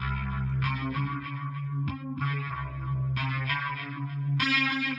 bass cold, Loops

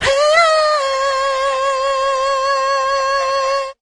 hey SUNG

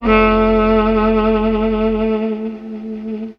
sax; Winds

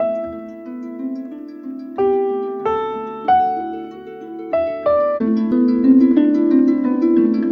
keys, sad loops

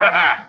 ha ha, voice; ooh ahh

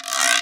guiro

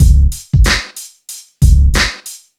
Drum loops, Boombap

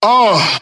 uh

